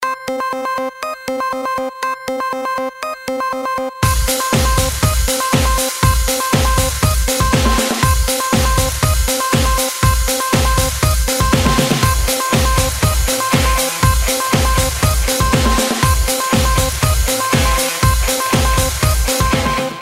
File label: Technorocker